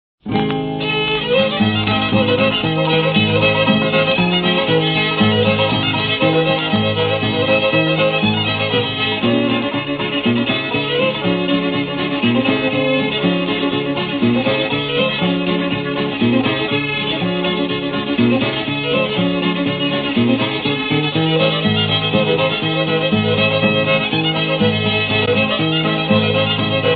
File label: Cacklin Hen Rooster